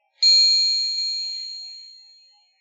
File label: bell